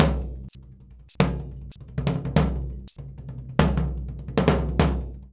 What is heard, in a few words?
SURDO, Modern Percussion Loops; Soundscan vol.56; AKAI; SURDO 100